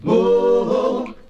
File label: "woah" SUNG; ooh ahh